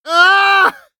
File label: scav death, voice; Escape from Tarkov; scav6